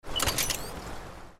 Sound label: tool draw